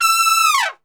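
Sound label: E4 trumpet, Brass Super Section; Best Service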